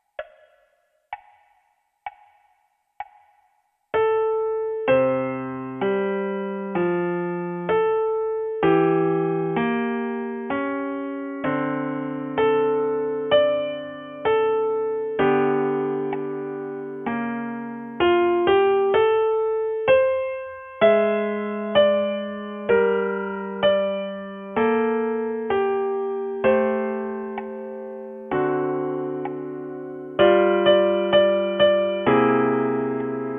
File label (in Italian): piano unite